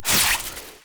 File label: Scythe Spin, Weapons; Stalker Jade Scythe; Warframe